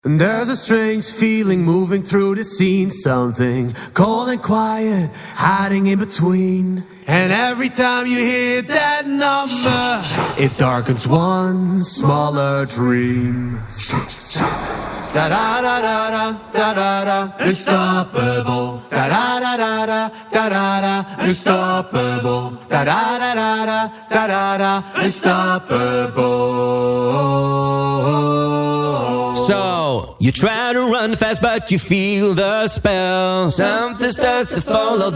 acapella

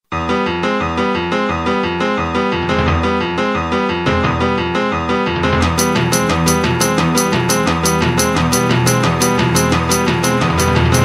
roblox music intro

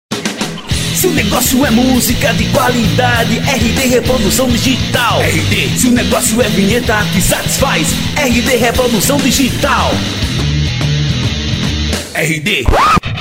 rock

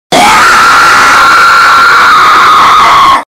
jumpscare sound

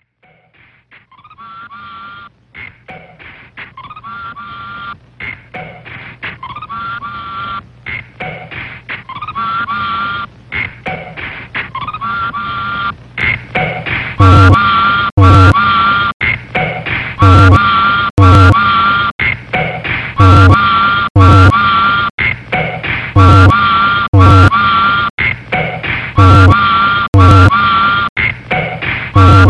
instrumental

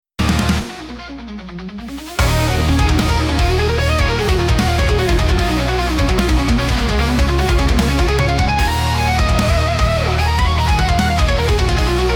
Retro Race

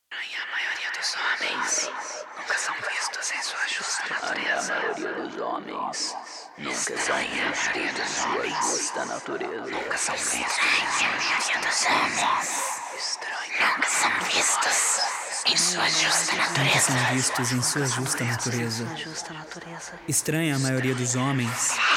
vocal only; moliere 1664

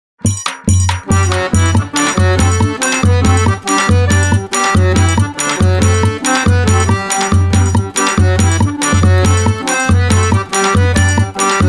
instrumental; pulando fogueira

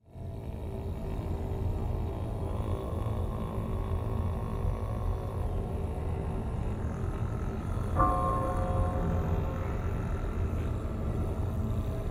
creepy orchestral horror music; inquisition

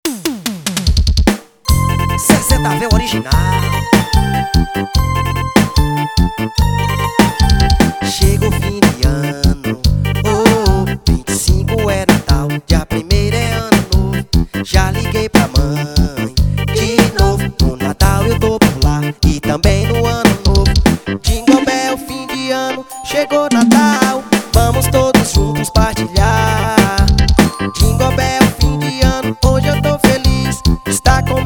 jingle bells kids

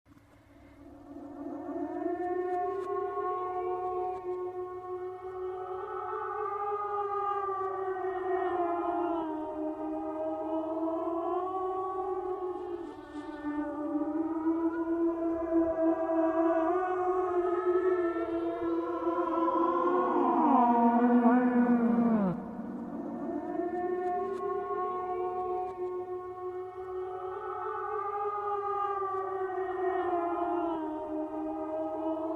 dark ghost woman singing free horror audio; horror audio for films games

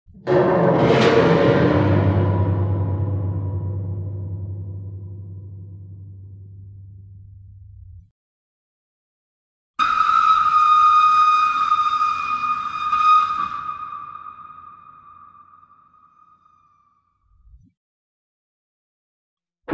horror jumpscare sound creepy sound

